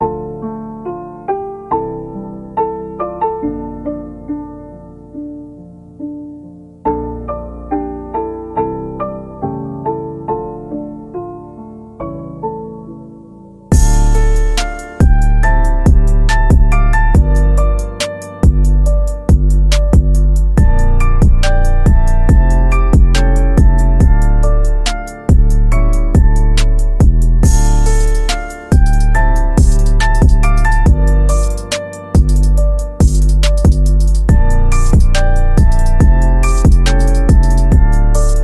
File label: Instrumental Rap Hip Hop Beats Sad Trap Beat